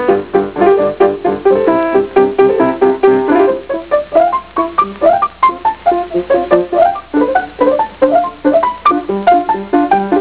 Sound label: piano